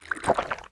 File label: energizer